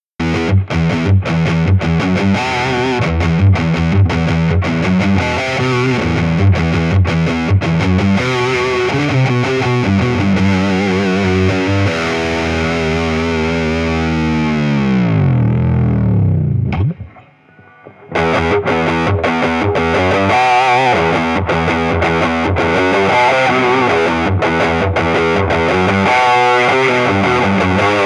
hi gain shape; Boost channel hi gain; Celestion Rocket 50

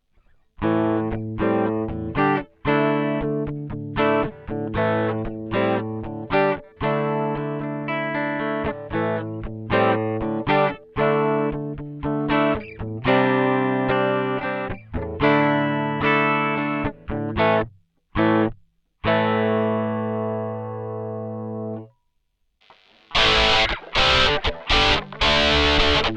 rocktron 5 preamps, Rocktron Utopia G200

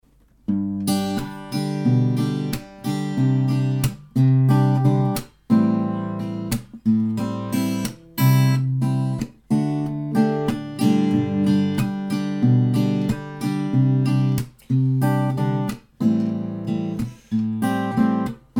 crafter castaway mic & piezo

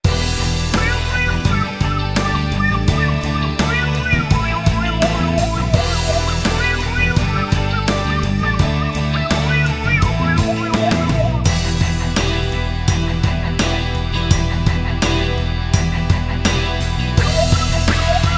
green rock

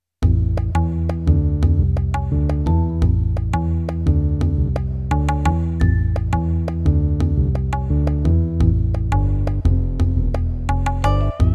ctk-6200 seq

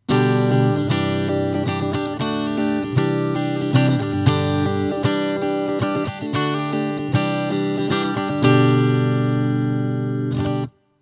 acoustic sim; Cube 40XL

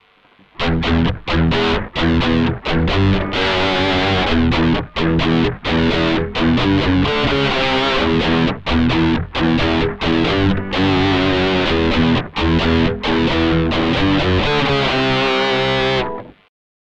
rocktron pitch -12; Utopia G200 pitch test

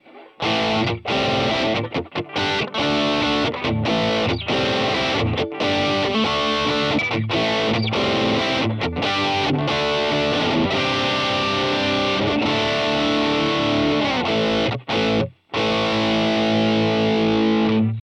bulldog drive

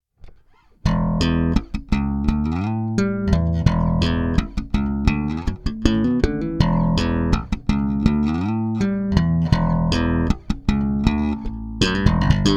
slap

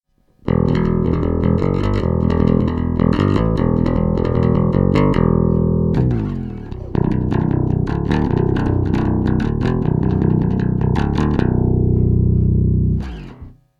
pick

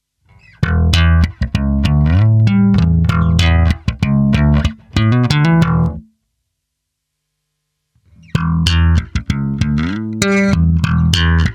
slap

